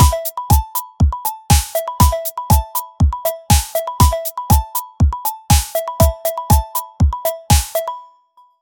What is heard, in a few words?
upbeat pop electronic mix; vlog